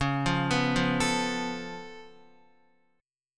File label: C sharp Augmented 7th C sharp down Guitar, chord; C#+7; arpeggiated; JGuitar